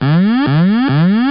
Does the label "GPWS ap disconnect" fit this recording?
Yes